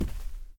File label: Step Carpet